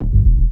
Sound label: Bass Short Hit